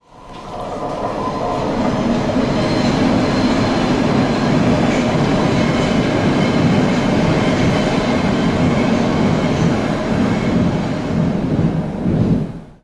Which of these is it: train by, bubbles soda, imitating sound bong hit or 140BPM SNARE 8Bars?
train by